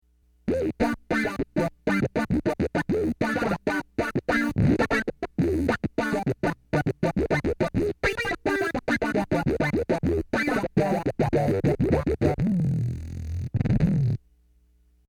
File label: Spit n Spank